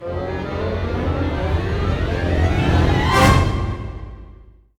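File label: 144 magic, orchestra; East West; Scoring Tools; Magic Bottle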